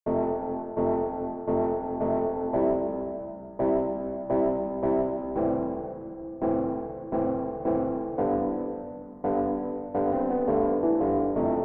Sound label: forest path gaming music